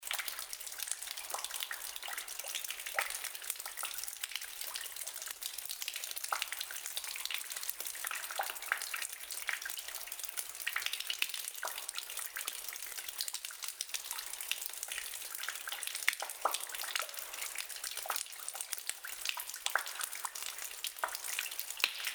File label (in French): chutte